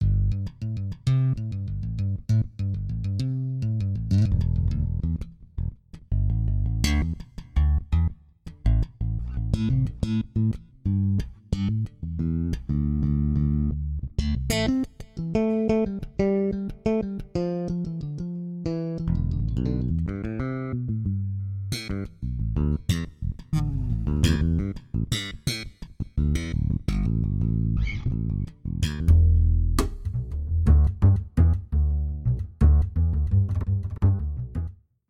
Expressive Bass

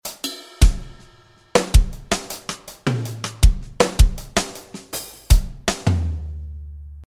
Tight Wad drums

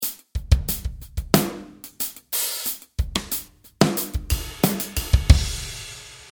Phat Rock; drums

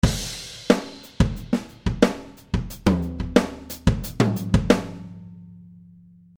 Studio Kit A

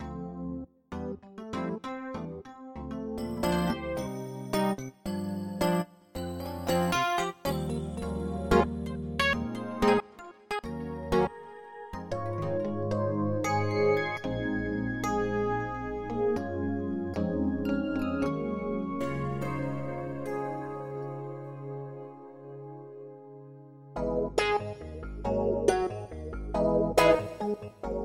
Crispy Keys

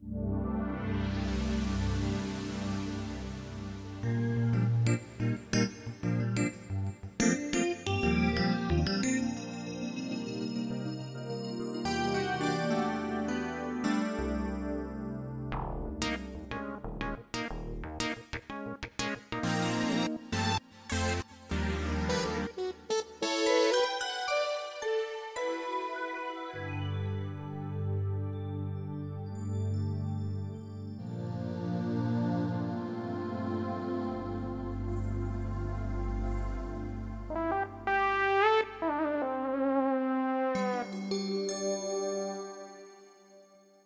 Tri King Synth

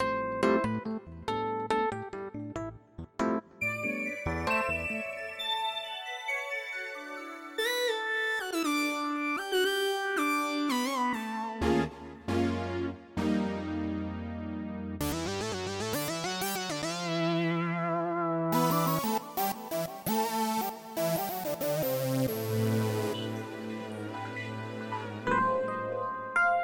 Y Synth; Y Synth Volume two

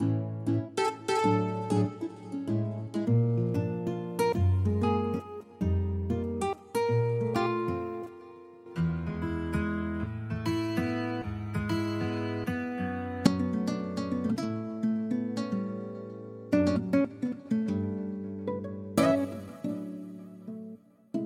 AC guitr beter, guitars; AC Dream Guitars

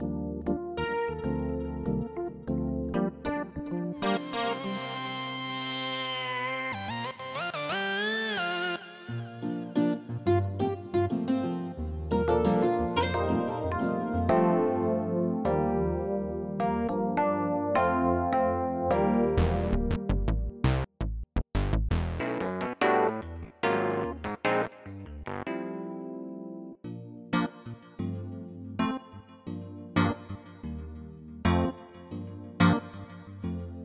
M SYNTH

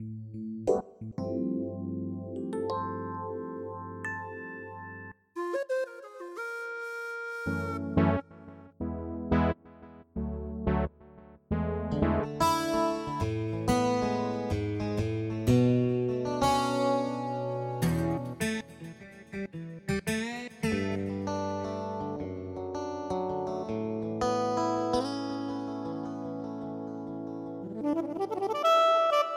Y Synth; Y Synth Volume one

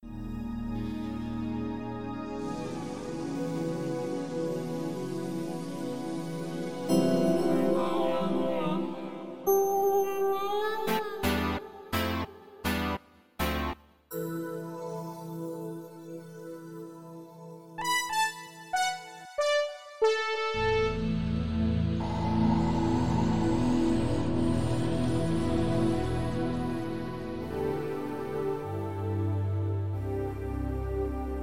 WS Synth

